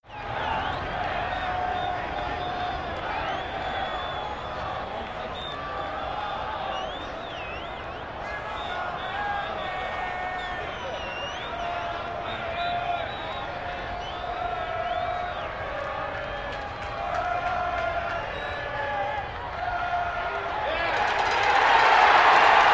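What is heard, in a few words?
Arena Crowd Yell Cheer; sports arena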